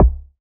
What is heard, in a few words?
BD, BASS DRUMS; EdgeSounds